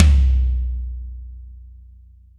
tom 16

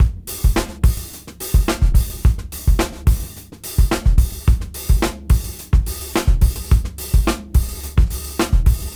Junky Funk 107 BPM

